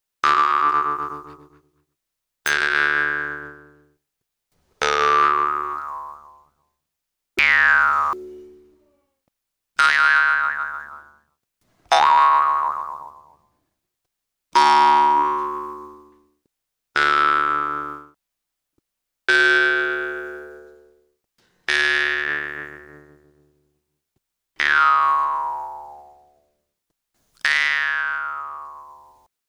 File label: Didgeridoo And Overtone; Good Stuff Audio